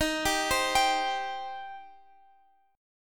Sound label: C Minor Eb